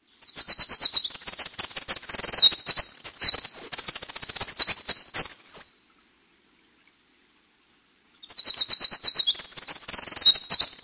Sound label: winter wren